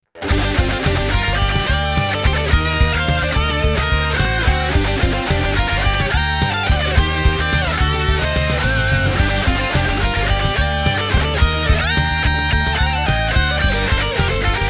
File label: hard rock clock alert; Nokia; audio themes